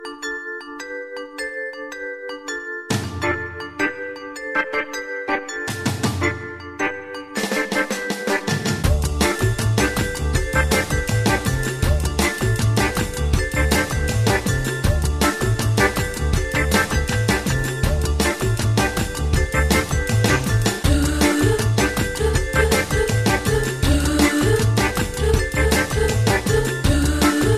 Swinging Sixties

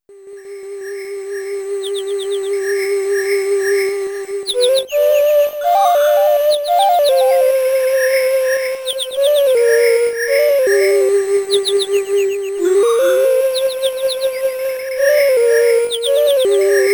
Stage Forest; Mysterious Worlds; amiga

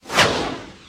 attack

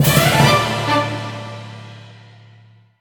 Fanfare Victory

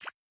Supportshield Autoattack Shot; boomerang fu

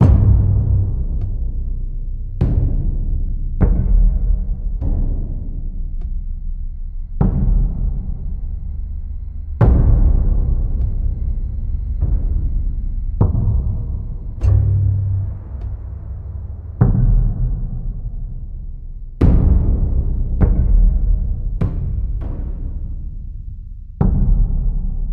Loop Tense; Game of Thrones: A Telltale Games Series

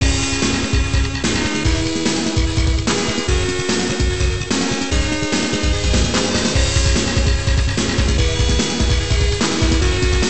Stage completed; Guardians; arcade

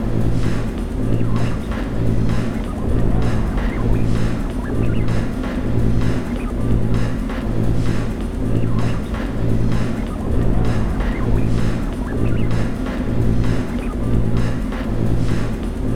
Simulator Room (Ambience); Bionic Commando Rearmed

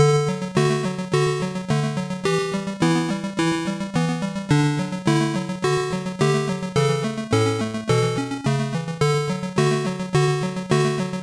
Taiko Performance Song

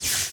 Ambient Electric Shock; Among Us

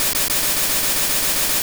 Hypnosis

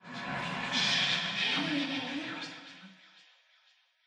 Voices Ambient

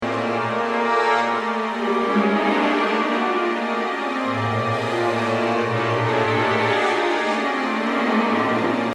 Crescendo Loop; Star Trek Deep Space Nine The Fallen

